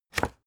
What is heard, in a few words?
spring binder lock hard